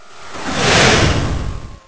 SWOOSH; Groovy Bytes: Behind the Moon

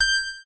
status alert; whosin

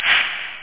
shaker; drums

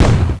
BLAST

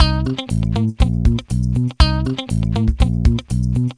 Funky 70's, hold music; Gizmo

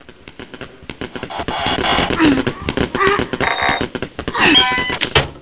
desk

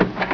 portal open; Glimmer 1.2

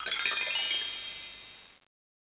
clic; Rainbow

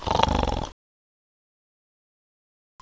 snor; Sexy Games 3

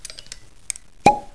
cork pop

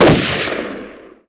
shot; BESTSHOT; noisybit

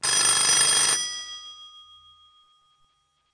natura voip new; Spik

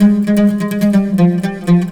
SITAR ETHNO 125; eJay; Guitar

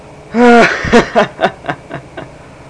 gen laff, People; Sound Library 2000; Wayzata Technology

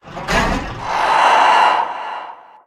mine cart creak; darwinia demo

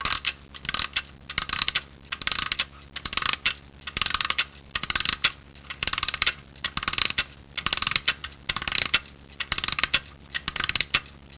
winding; WAV Sound Effects; houshold; clokphon

